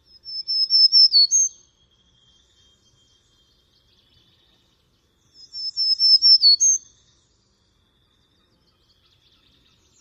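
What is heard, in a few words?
Black-throated Green Warbler songs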